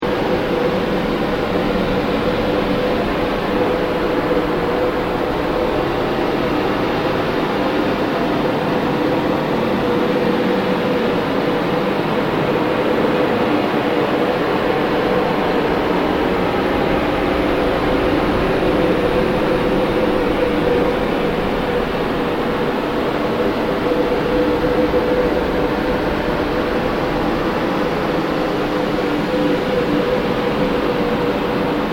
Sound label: Ambient Noise, Drone; Sound effects created by manipulating other sounds; Drones; Sound Design